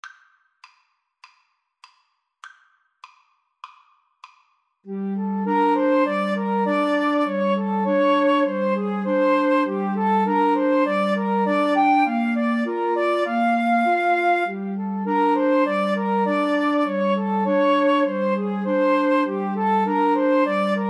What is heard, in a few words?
Mountain King, 2 Flutes Clarinet